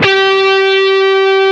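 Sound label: DIST GT2 G3, distorted guitar; DIST GUITAR2; AKAI S-Series CD-ROM Sound Library VOL-7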